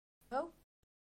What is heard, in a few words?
hahaha